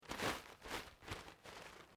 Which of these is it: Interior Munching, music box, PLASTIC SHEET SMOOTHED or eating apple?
PLASTIC SHEET SMOOTHED